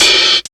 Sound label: Ragga & Dub Reggae; USB Soundscan vol.27; AKAI